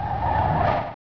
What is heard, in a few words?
dust skid